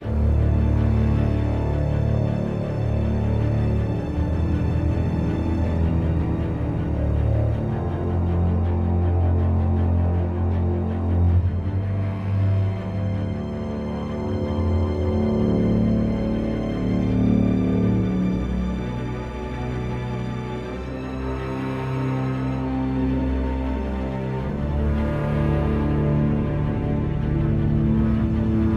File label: Loop Fight; Game of Thrones: A Telltale Games Series